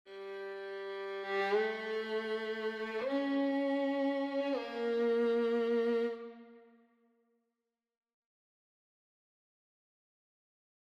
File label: Violin 2